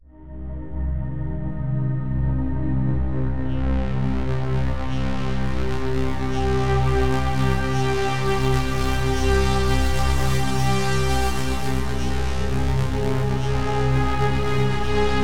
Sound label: Sweep; KORG OASYS; oasys assault